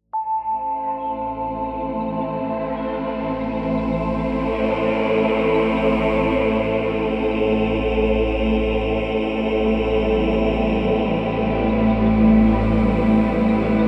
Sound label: Chakra Balancing Binaural Beats Meditation